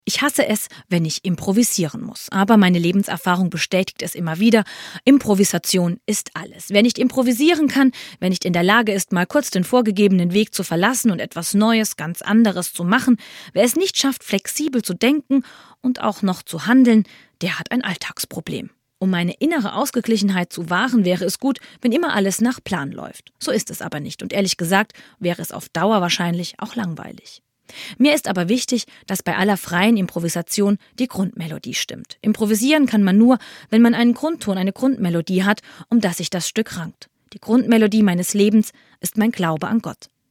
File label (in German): improvisation ist fast alles